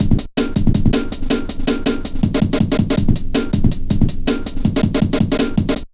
metallic stepz, drum loops

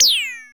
FX Analog; Sound & Vision - Gigapack II

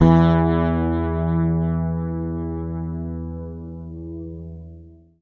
E, Bass; Sampleheads; Will Lee Bass Library

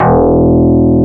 XFM BS, FM Syn Bass, Synth Bass

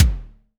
KIK, Pearl DRX-1

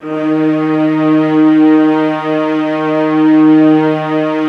LOW D#3, SFT LOW VLNS; Q Up Arts; Denny Jaeger Master Violin Library

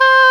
oboe dry; Roland L-CDX-03 Disk 1; Oboe 4-8

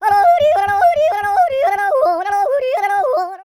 YODEL; ILIO; Vocal Planet

